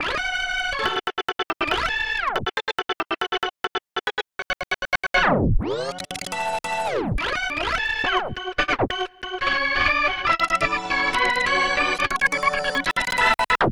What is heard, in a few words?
Mine Chop Organ; Bust-n-Rush